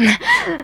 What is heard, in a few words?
Grunt; Lewd SFX